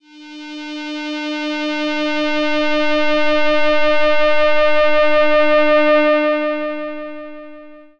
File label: Pelog, Drone; Instruments; Ableton; Drone Lab; Xtonal